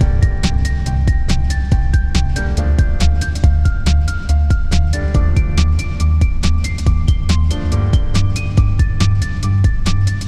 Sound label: Night Rains (piano) (loop)